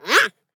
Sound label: Otter attack